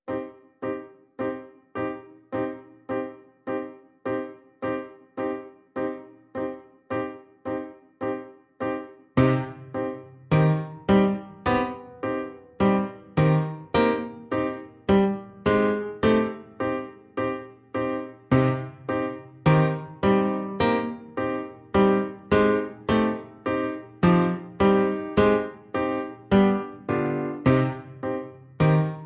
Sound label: Piano Only